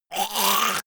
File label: monster choking high; comedy-cartoon; soundpool